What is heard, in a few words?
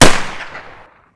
ump45; weapons